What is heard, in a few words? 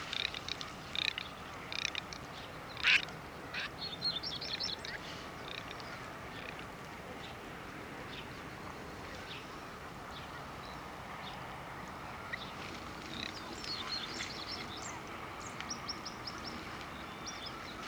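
garganey spatula querquedula; utok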